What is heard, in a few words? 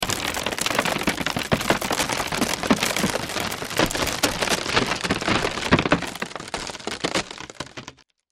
Crash Wood Crack Splinter Split Ceiling; Cracking; Blastwave